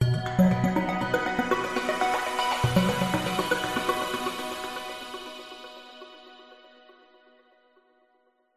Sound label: multimedia logo musical synth arpeggio science tech medical trusted warm; zapsplat